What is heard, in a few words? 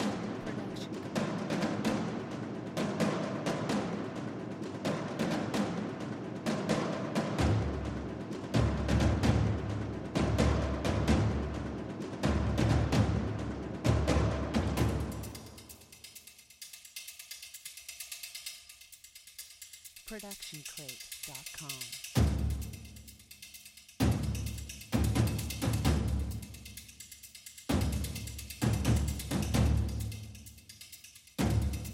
on your feet bpm 130; soundscrate